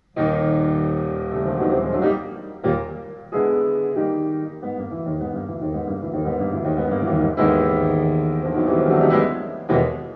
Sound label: analog